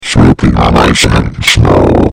SLIPPING ON ICE AND SNOW

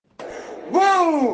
boom; CHURUMBEL

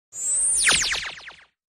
long laser; Long Laser meme

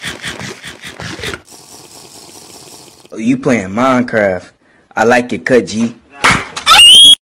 minecraft noises; i like your cut g

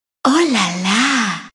oh lala jingle

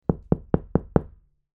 knocking on door five knocks

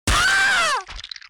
cuz aaaah

